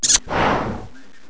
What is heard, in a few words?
blow kiss